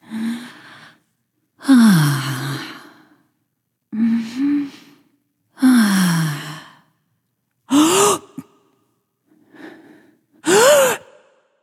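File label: nonverbal breath; smite; voicepack; izanami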